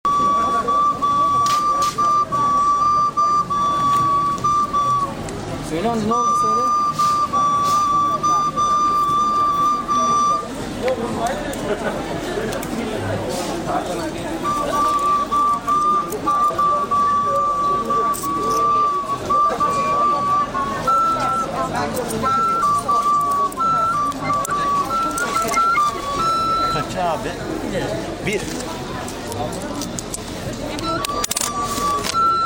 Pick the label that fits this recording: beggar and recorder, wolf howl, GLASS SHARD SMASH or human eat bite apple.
beggar and recorder